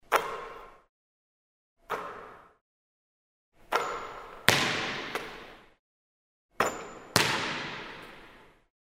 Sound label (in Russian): zvuk basketbolnogo mjacha